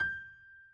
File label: key